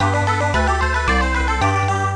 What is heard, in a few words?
Jingle Level Complete